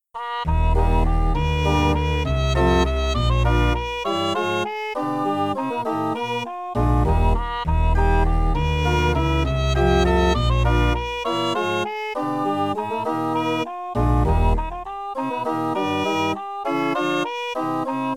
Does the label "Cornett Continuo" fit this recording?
Yes